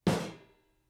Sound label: Metal Footfall; soundscrate